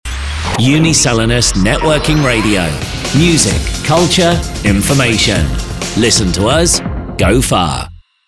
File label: Jingle ENG; Uniselinus